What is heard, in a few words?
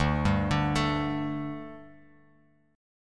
D Suspended 4th D, Bass Standard; arpeggiated